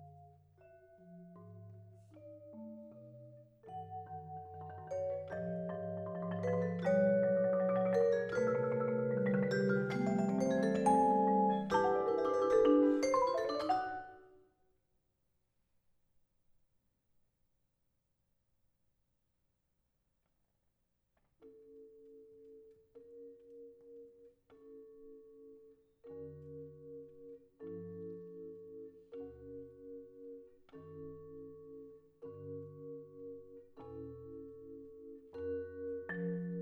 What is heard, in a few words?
4 Mallets 2 Balls